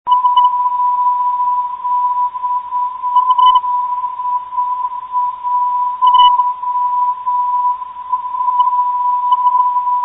503.97kHz, ndb

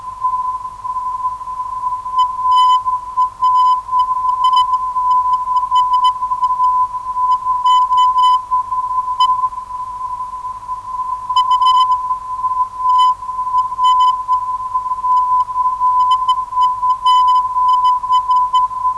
MS AR 343kHz

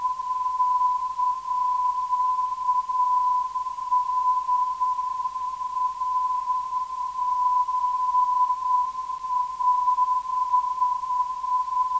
357kHz, ndb; CAS

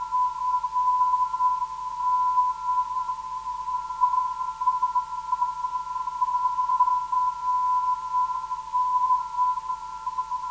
368kHz, ndb

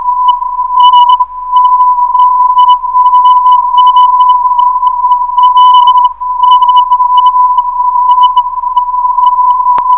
348kHz, ndb

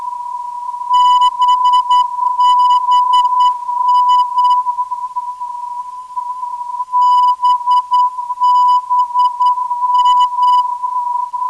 390kHz, ndb

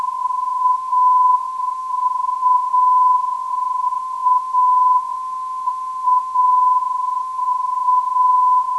343kHz, ndb

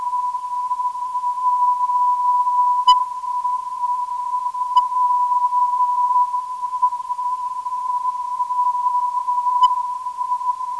M 476kHz

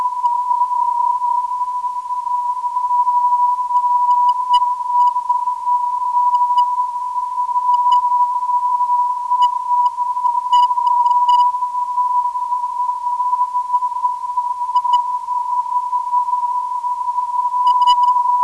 ZA 295kHz, ndb

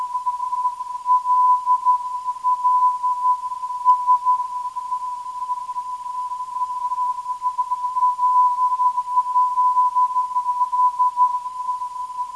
LLS 326kHz

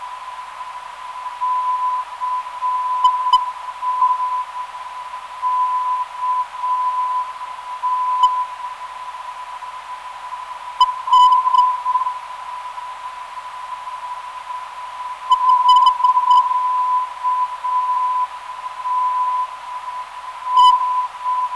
510.92kHz, NDB

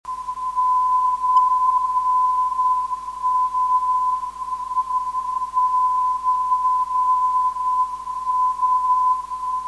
476.3 kHz, ndb

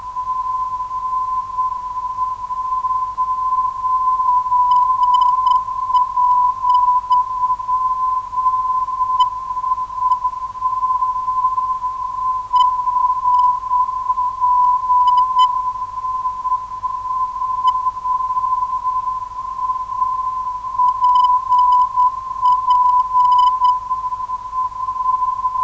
gja 412khz, ndb

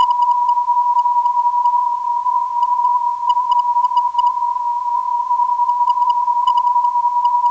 405kHz, NDB

